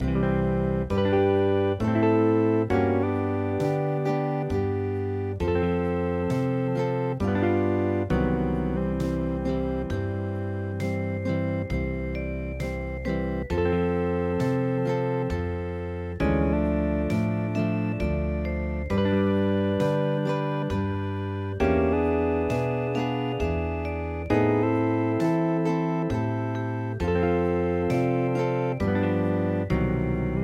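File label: hawaiian; merry xmas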